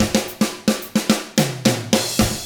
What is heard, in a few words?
110 SAMBA, Drums; ILIO; Double Platinum Drums 1